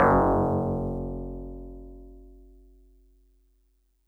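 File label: Tubular Bells DX100 F sharp 4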